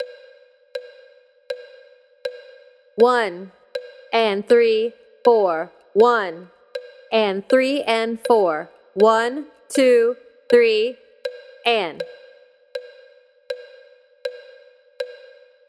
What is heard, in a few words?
8th Notes; Eighth Notes, Example 2